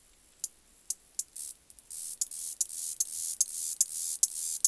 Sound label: vermin; Mafie 1